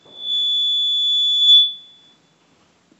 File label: Mic Feed